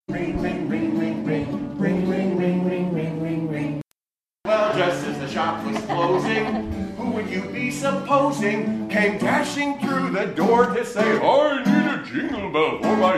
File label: Bell